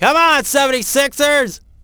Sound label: "Sixers", fan; NBA Inside Drive 2004